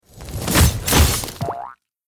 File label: attack; hero